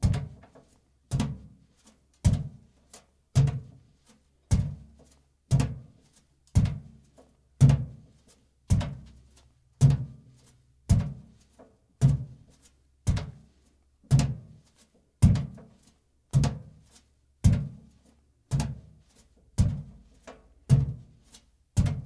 bare feet metal slow med walk; sonniss gdc

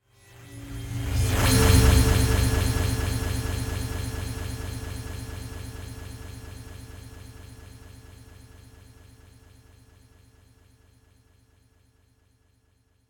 transition glitch; sonniss gdc

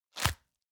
break hit celery; sonniss gdc

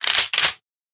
shotgun rack; lancer weapon fx

